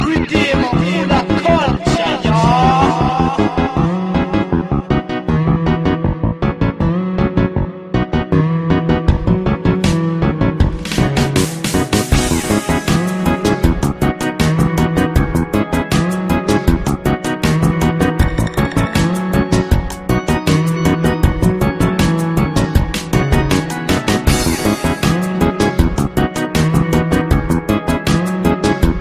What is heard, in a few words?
reggae beat